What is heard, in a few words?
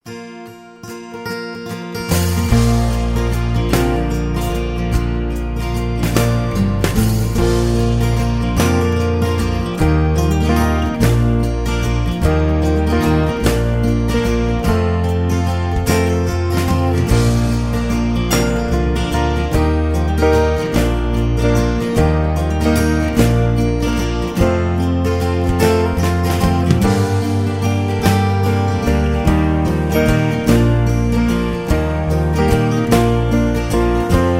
Beautiful Melody Ringtone